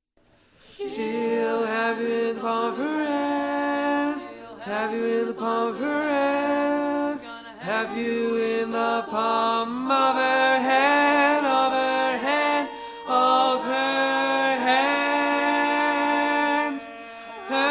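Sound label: Baritone, barbershop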